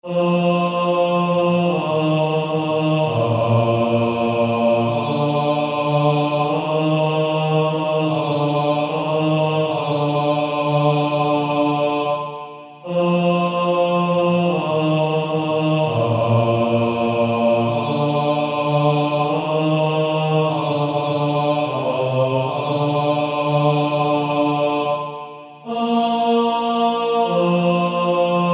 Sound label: Male Choir; Pass me Not O gentle Savior